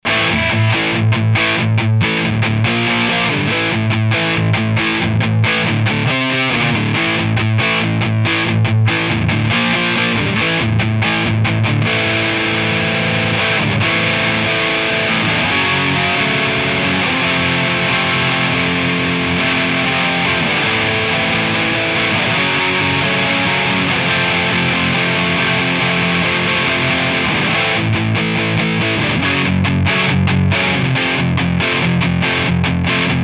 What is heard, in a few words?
AMP DIst; Gemini II